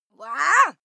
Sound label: female fight attack shout; schreie; menschen